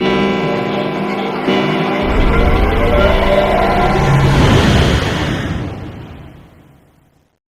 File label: time machine